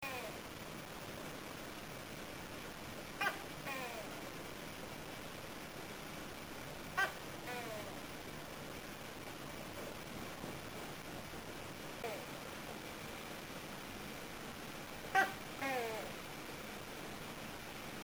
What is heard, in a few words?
gekko; thai